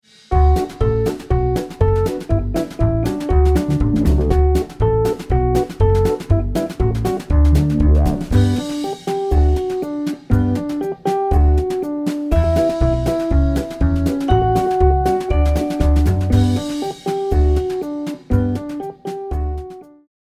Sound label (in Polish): auto podklad z melodia